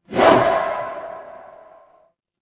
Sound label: transition swoosh